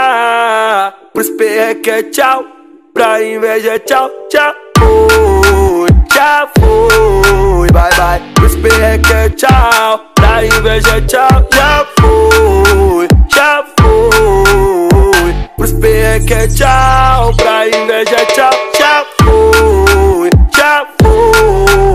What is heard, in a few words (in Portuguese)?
musica; cstrike